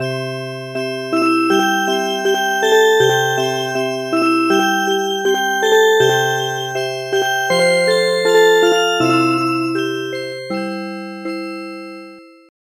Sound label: Music Box